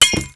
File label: Glass Breaking; Mio 8670; GPS